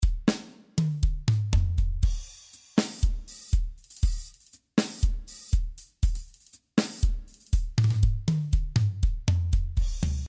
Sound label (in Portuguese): BATERIA ARROCHA